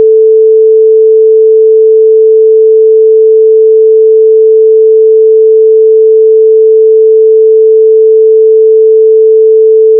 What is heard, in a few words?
Sine 440hz mono; 0dB; 10seconds; 44.1khz; 16bit